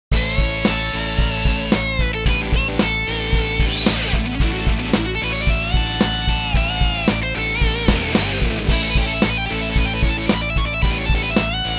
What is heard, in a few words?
PSR S670 Rock